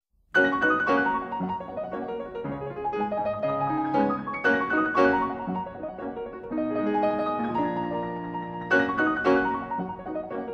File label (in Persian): ساز پیانو